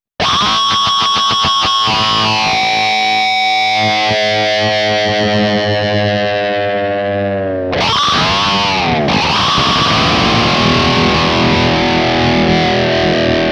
Comp off Lead Long Decay; Auto Quack

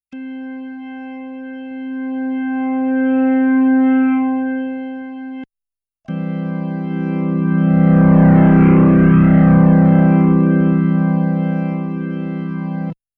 B3 Leslie Overdrive

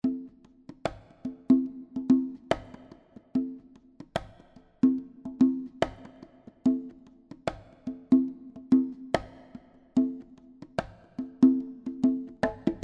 Large Chamber

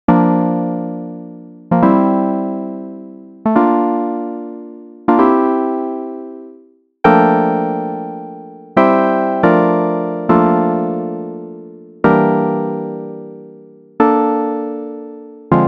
Pro V Mellow House Piano

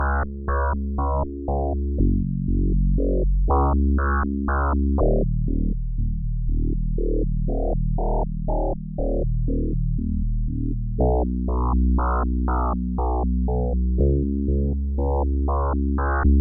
Magellan 2 Bass Mod

